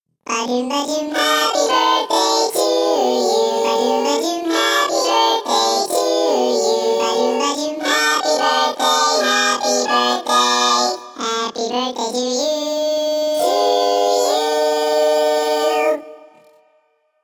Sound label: Happy Birthday in English